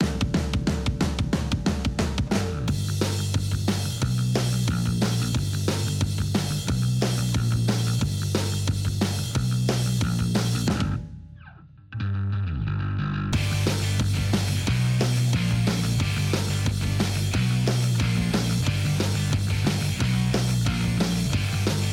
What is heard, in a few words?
English Tea (No Vox); Disney Infinity